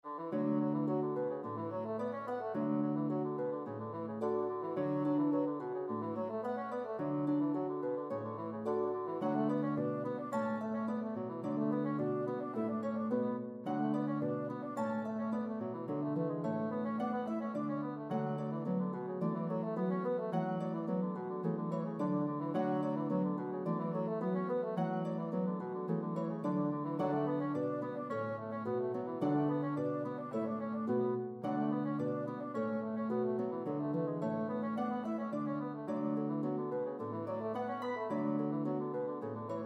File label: Bassoon Harp; Duet for Bassoon & Harp; This reel is charming as a Wedding Recessional or for a Festive Event.